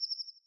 Night Cicada Fade; Zelda; OOT

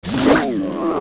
Dead Hand Hit; zelda; OOT

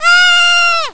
Girl Cry; Girl crying

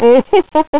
Man Laugh; Man laughing

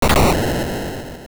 Boss Big Boom; Various "Boom" sounds from bosses; Head Thwomp falling, Gleeok's ground-pound, Ramrock clasping hands together; Zelda Oracle

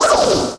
Sword Spin; Young Link

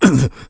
Sumo Slapped; Sumo match: Bo slapped by Link

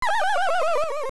Fall; Link falling in a hole

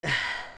Hot; Link moaning in the heat; Adult Link

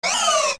Hit; Baby Dodongo; zelda; OOT

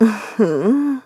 Stylish Woman Mmm Hmm

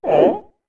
Punch

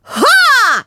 HA; The Lady: "HA!!" (heard at beginning of each battle form and when zapping all umbrellas)

